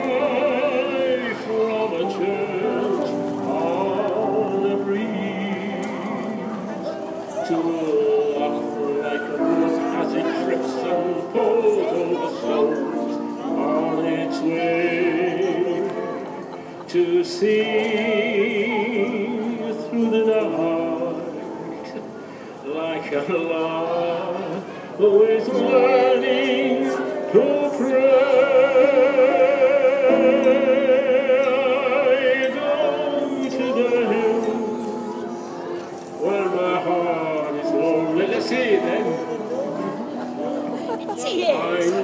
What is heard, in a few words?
busker